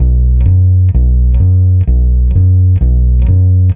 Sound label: Simple 5ths, Bass Drum Kicks